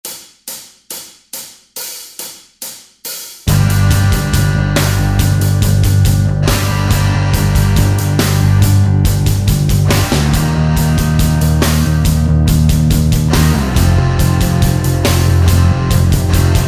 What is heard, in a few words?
g minor 140bpm